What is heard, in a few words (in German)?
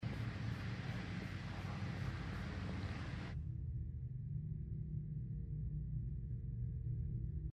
uebergange normaler raum druckraum; inside